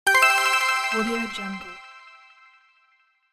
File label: points achieved; game